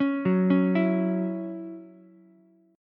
C sharp Minor Double Flat 5th C sharp Ukulele; C#mbb5; arpeggiated; JGuitar

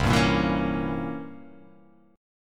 C 7th Flat 9th C; C7b9 strummed; JGuitar

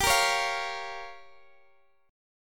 G Minor 7th Flat 5th, Guitar, Open D; chordshape; down

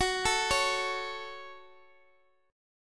F sharp Minor F sharp, Chord

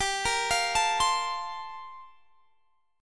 G Minor 11th G down Guitar Drop D

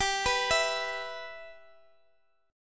E 5th G, E5/G chord; arpeggiated; JGuitar

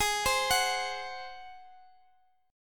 Ab 7th Ab down Guitar Open D

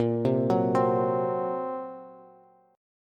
A sharp Suspended 2nd Sharp 5th A sharp, Bass; A#sus2#5 arpeggiated; JGuitar

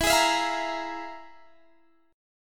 F Minor Major 7th Double Flat 5th

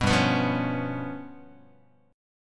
G 7th Flat 5th, Guitar; G7b5 strummed

